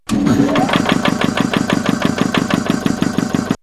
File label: Slow Starter; X-Static Goldmine